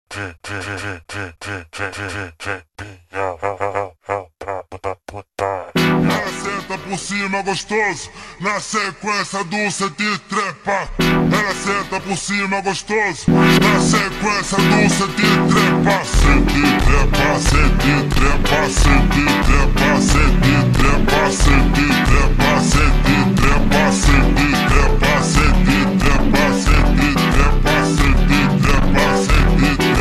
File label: Montagem Vozes Grito ultra Slowed, funk